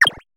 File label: confirm